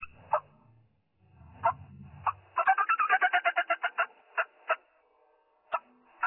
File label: fly down